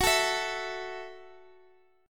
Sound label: F sharp Minor 7th, guitar; F#m7 strummed; chord shape